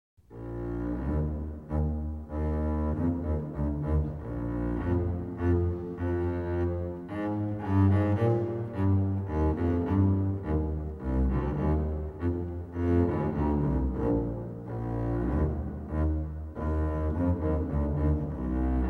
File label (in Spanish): Sonido de contrabajo; Instrumentos de cuerda; Cuerda frotada